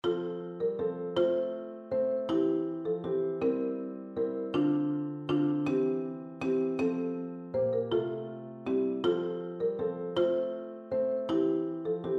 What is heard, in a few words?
mozart sonata, percussion; k331